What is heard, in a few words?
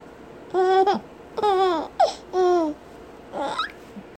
Baby Giggle